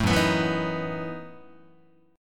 F sharp Minor 13th, Guitar; strummed; JGuitar

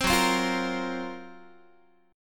F sharp Major 7th Flat 5th F sharp, Guitar; F#M7b5 strummed; 15,14,15,15,14,x; 4A,4E,5A,5D,5G#,6C#; chord shape

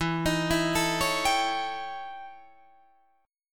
E Minor Major 13th E Guitar DADGAD

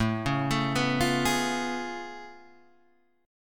A Augmented Major 9th A

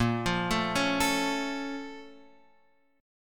A sharp Minor Major 7th, down; A#mM7 arpeggiated; JGuitar

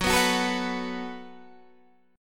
F sharp Minor Double Flat 5th, Guitar, DADGAD; chordshape; down

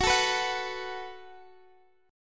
F sharp Minor Double Flat 5th, Guitar Open D; chordshape; down

